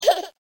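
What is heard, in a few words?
baby giggle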